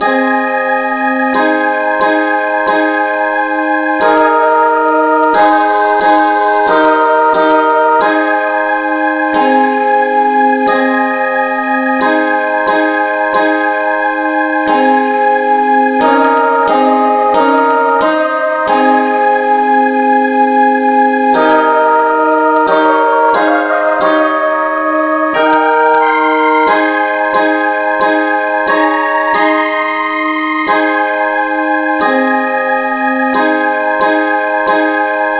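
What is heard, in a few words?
no vocal key A flat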